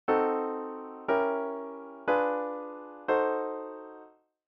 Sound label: diminished 7 progression